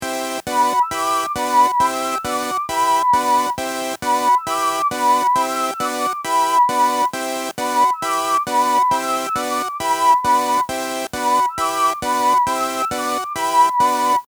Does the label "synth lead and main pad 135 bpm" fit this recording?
Yes